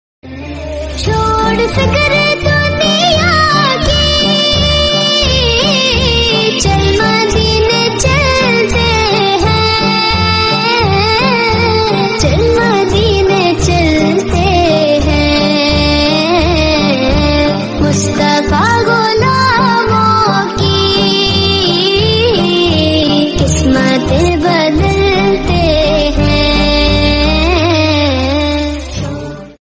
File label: islamic beautiful ringtone nazam naat sharif ringtone beautiful heart touching ringtone